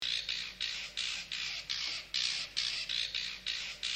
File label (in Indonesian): varian lagu burung cendet